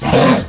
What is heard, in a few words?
zombie look; svencoop; doomfest